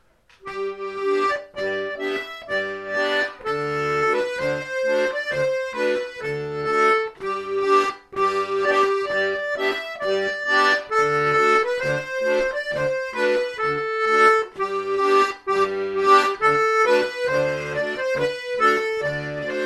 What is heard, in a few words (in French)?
branle aqueras montinas Diato